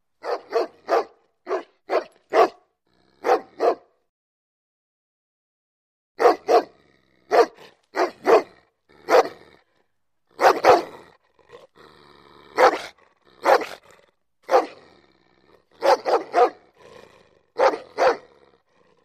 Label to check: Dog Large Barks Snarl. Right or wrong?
Right